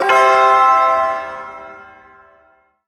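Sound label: Shawzin Pentatonic Major Chord; Pent Maj Chord G; Warframe; Duviri Ersatz Shawzin; Chords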